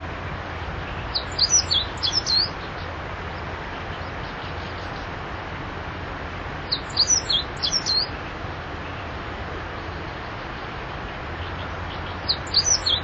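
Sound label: hojiro, meadow bunting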